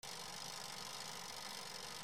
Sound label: KITCHEN